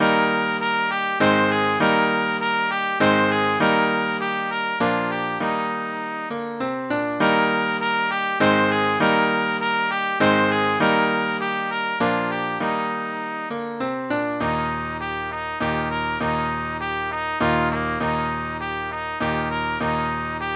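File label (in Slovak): pre trúbku a klavír; Nesiem vám noviny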